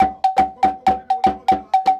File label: 120 WOOD